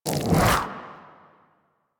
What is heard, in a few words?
Venom Cast; Warframe; Warframes; Saryn